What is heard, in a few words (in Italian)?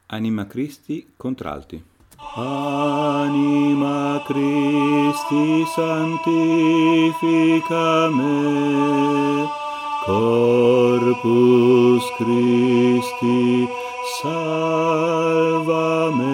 Voce Contr Alto; Anima Christi